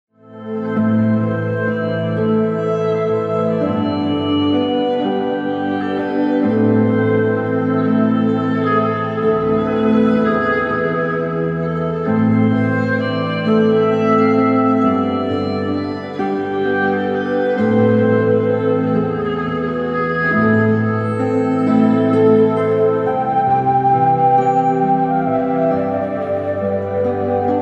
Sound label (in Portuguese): ORQUESTRA; GRENNSLEAVES